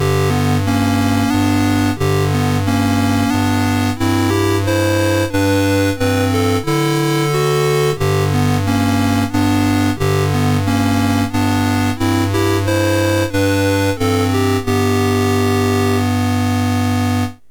The Wood Birds Lullaby, MOS6581R3

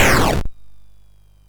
Frantic, MOS6581R4

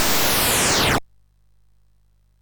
MOS6581R3, GAMES; Spikes Peak

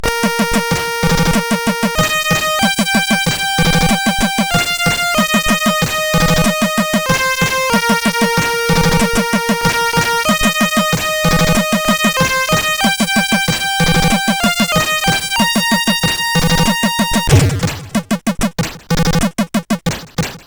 Outrun sid CSG8580R5

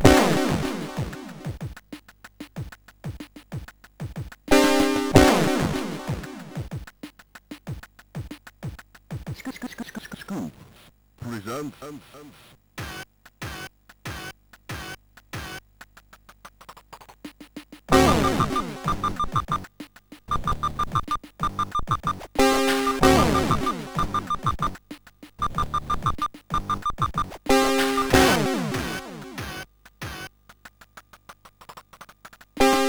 intro, CSG8580R5; Eye to Eye

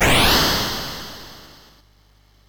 Project Firestart, MOS6581R4

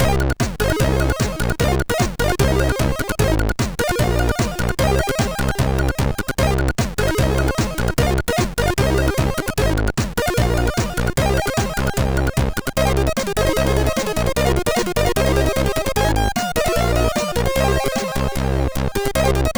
Intro, sid MOS6581R3

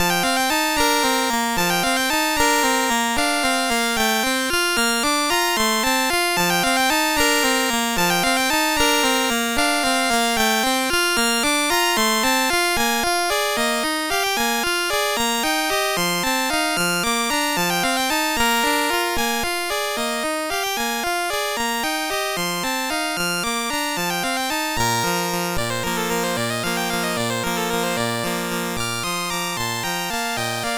Waltz, MOS6581R3; Joseph A Grau

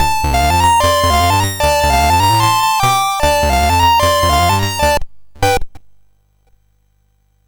sid MOS6581R3, GAMES; Continental Circus

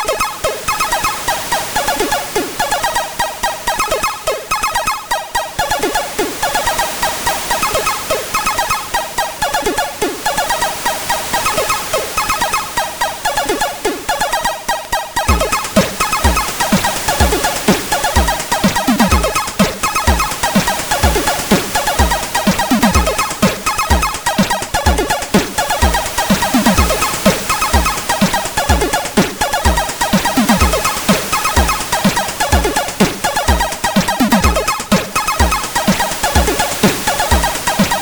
Beach song sid CSG8580R5

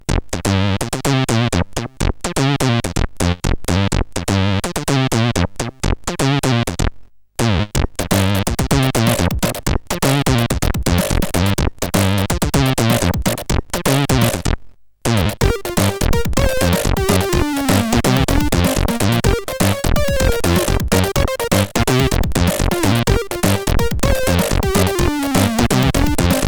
Funky, sid CSG8580R5

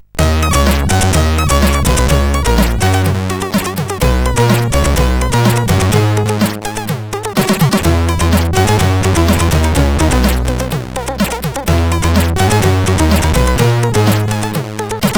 Tune 3, sid, CSG8580R5

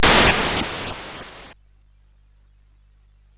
Frantic, CSG8580R5

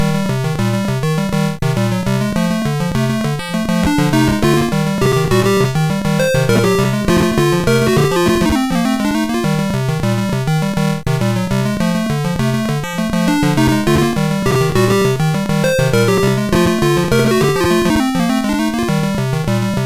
Musicbox, sid MOS6581R4

